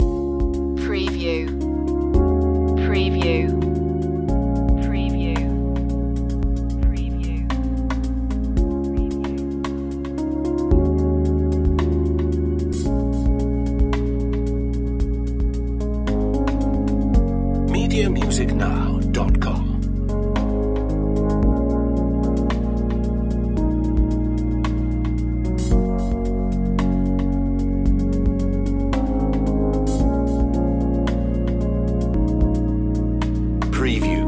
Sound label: stirring spirits loop